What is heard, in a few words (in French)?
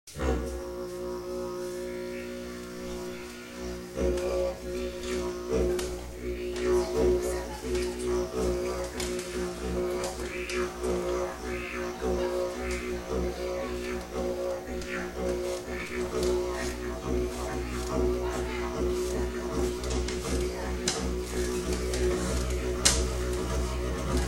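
Train à vapeur didgéridoo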